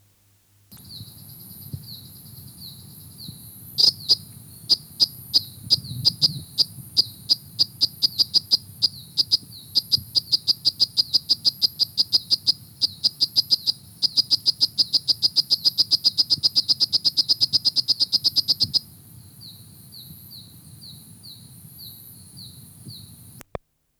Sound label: Philautus lissobrachius, Mulu Kerangas